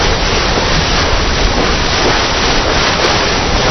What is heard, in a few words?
Water surface; 暴力赛车